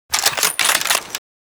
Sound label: m700 bolt, weapons